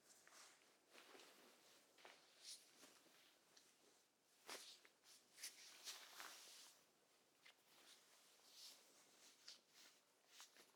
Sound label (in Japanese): 布団もぞもぞ真正面へ; 動作系